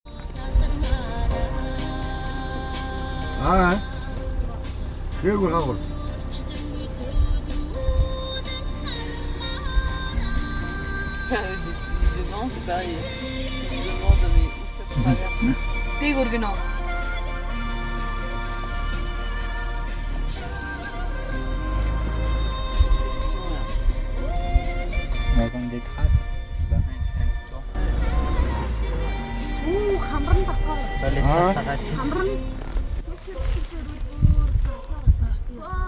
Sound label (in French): en voiture ambiance, Mongolie Arkhangai